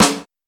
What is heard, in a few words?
2000s Sparkle Snare Drum C# Key, Royality free acoustic snare sample tuned to the C# note